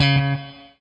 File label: ROCK, Guitar